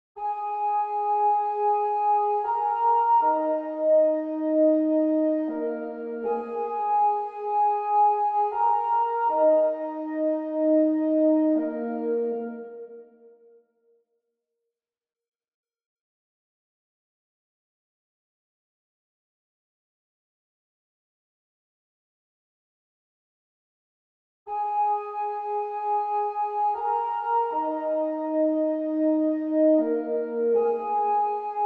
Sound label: 158 BPM D# Min Pad Lead; Loop Stems; Cymatics; Fill Up My Mind